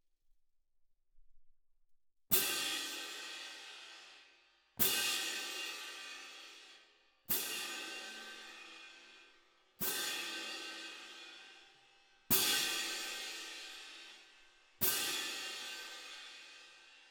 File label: Perc